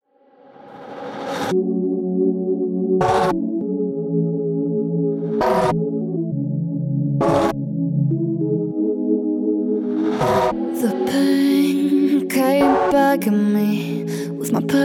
Vocals (Gesang), German

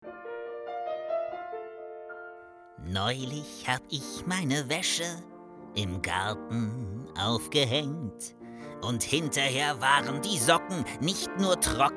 Gesang; LOFT VOICES

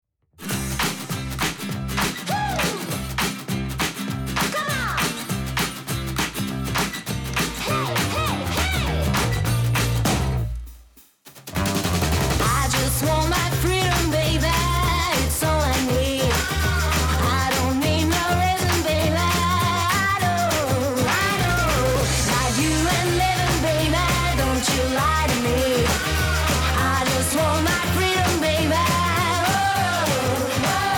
Werbung Gesang

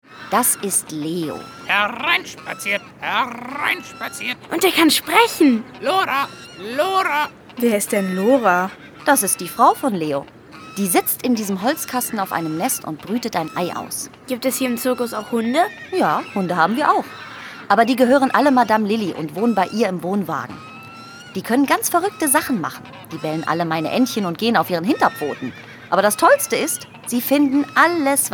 Zirkus mit Song; LOFT VOICES

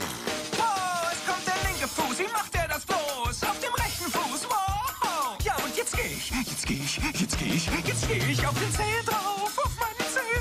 Gesang Rap Synchron

